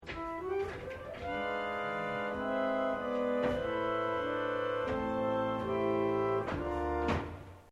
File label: Church organist plays phrase; Yvette Jackson; Mad Organist; Keyboards; Musical